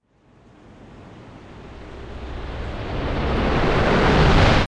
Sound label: Whoosh N Crash; Learn to Fly 2; Pacifist